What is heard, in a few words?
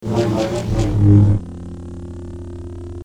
Saber; FXHome